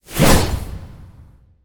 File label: Monster; Zenless Zone Zero